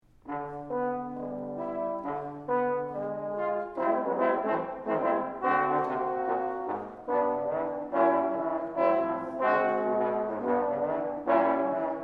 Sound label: Trombone Quartet; The Johnson State College Concert Band